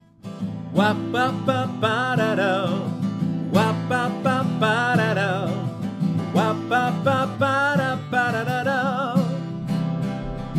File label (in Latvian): ar gitaru; Pakur pakur uguntinu